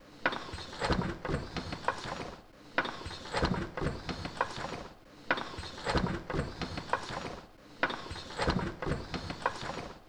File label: Elevator Up Throne Room